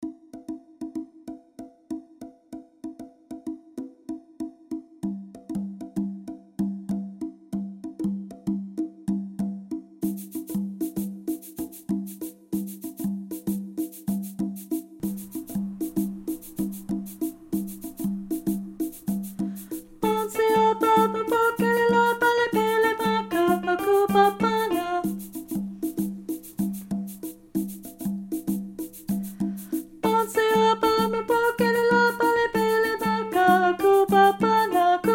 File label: Soprano 1; Rehearsal Recordings